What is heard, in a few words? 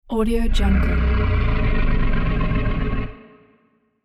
the sewer monster